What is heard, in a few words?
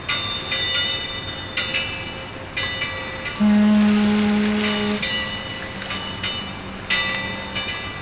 HARBOR; 10,000 Graphics Pack Volume 1; Media Graphics International